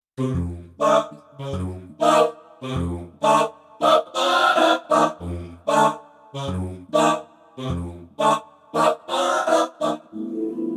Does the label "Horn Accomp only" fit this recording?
No